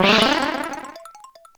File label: Magic Wand